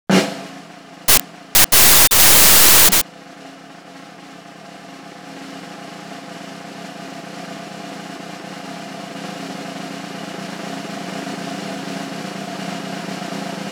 Snare Drum Snare Drum Roll

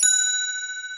beep

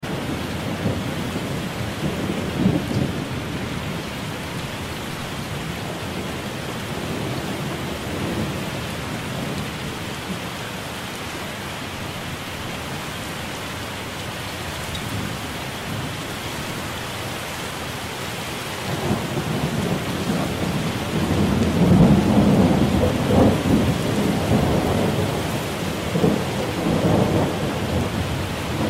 Rain Thunder

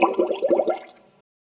catch

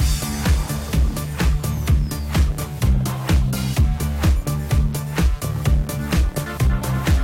soundtrack

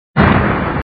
bomb

